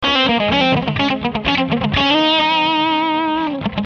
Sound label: Ab3 125 solo